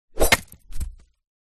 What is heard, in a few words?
warfare sword swipe body hit slash head or limb fall to ground